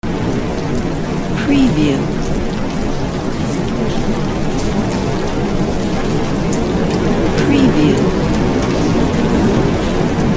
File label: rain and wind